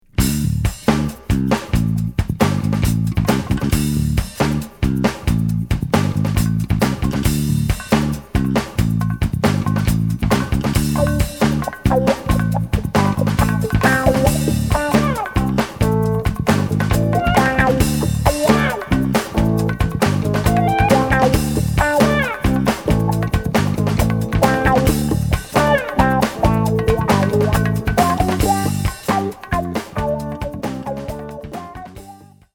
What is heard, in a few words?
SLIP FUNK